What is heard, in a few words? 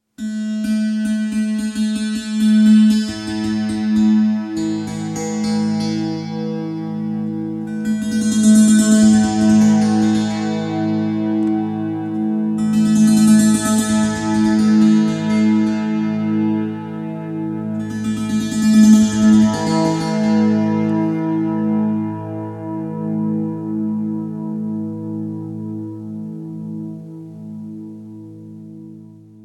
Monolini in A